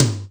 Tom, Korg Wavestation

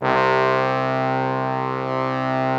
BRASS C2, ORCH BRASS; Greytsounds VOL-1

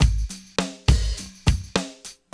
LOOP 103, Hip Hop, CHRONIC LOOP; InVision Interactive; 40 Oz Phat Slammin

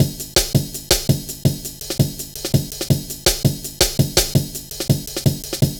Drum Loops, 165.5; Zero-G Jungle Warfare 1, 2 & 3

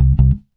bass stab

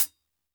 TING LP; AMG Gota Yashiki Groove Activator; TING LOOP 74

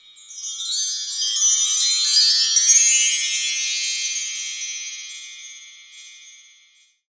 Wind Chime; Zero-G Jungle Warfare 1, 2 & 3; Strings & Things

